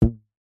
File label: rubber band large ping twang; foley; zapsplat